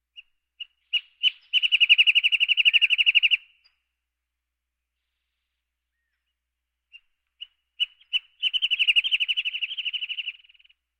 Birds

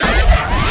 Pickup Scifi Energy

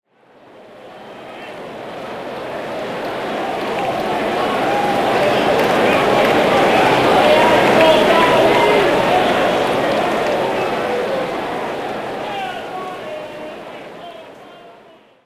Crowd Ambience; Pitch Black Audio Pong

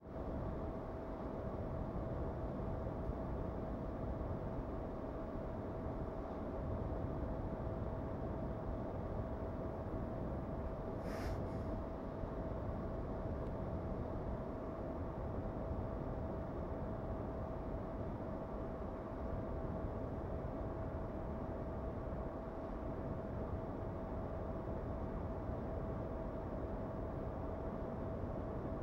air condition; devices accessories utilities; NS Studios - ultra sound collection

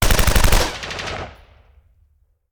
Machine Gun; Sound Ideas; Guns

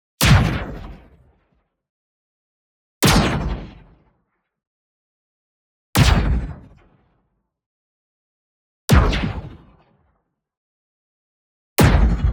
IMPACT MEDIUM Dispersed Hollow Hit; boom library; Matter

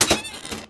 Door Small Metal Flap Open

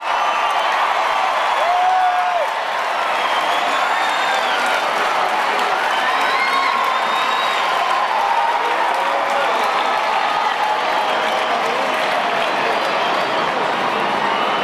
Baseball Crowd Cheer Chatter; Crowds

